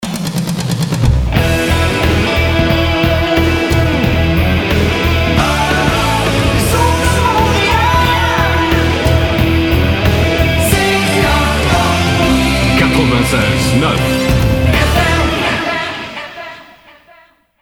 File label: vintage jingle; CKOI